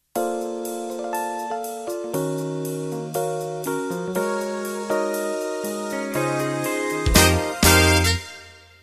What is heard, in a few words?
Smooth Jazz Intro